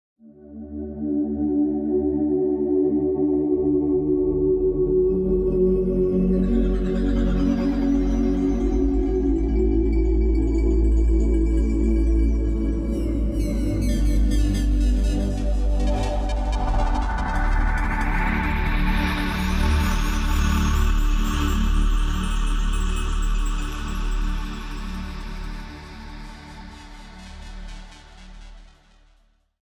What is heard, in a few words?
soundscape, modern synths; logic pro